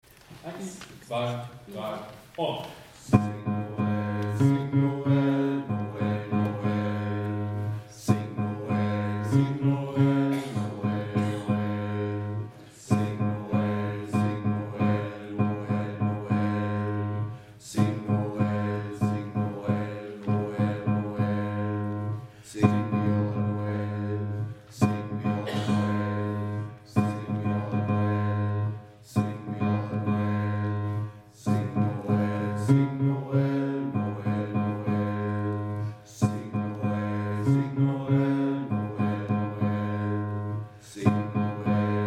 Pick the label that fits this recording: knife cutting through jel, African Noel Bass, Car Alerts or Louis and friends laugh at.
African Noel Bass